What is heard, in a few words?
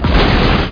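TNT explosion; Monster Media Number 15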